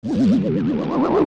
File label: Shrink; Bigocto; zelda; OOT